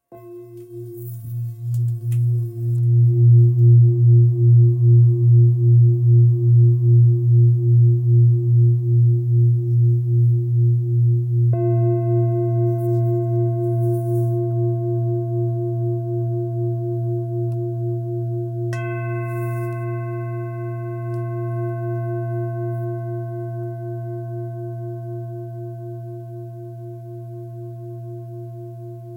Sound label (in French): Bol bengale la diese